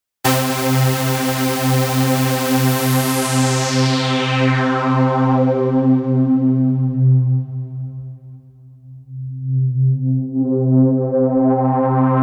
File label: special sound